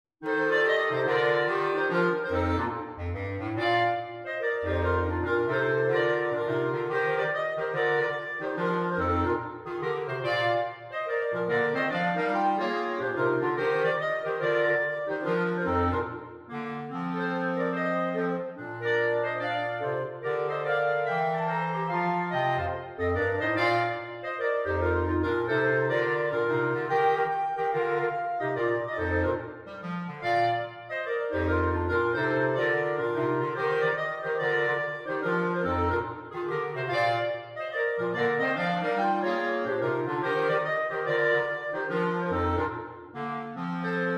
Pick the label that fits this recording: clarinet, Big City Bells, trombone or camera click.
clarinet